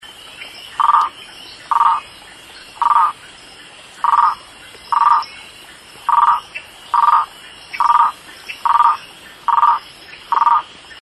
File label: Gephyromantis redimitus